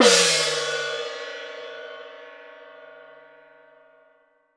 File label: GCO LO, orchestra hits; Best Service; Peter Siedlaczek's Advanced Orchestra